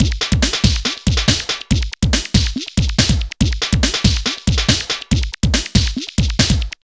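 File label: drum loops, right; Big Fish Audio; Prosonus 500 Drum Loops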